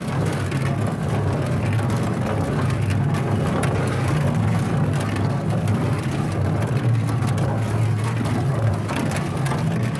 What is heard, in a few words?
scrape rough, metal barrel; physics; props